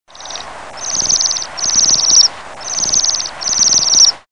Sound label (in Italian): Beccofrusone Bombycilla garrulus; Versi Animali; Il Forum della Fotografia Naturalistica